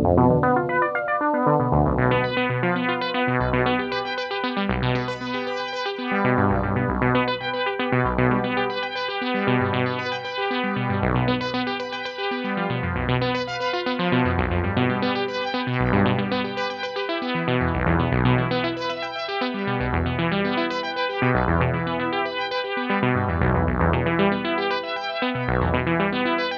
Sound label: Jazz